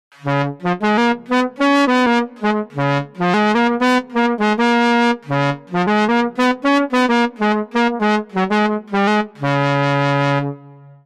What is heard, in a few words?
Saxophone